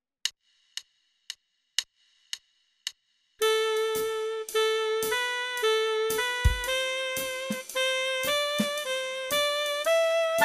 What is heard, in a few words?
melody only